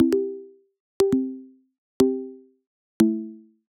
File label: Big Dripper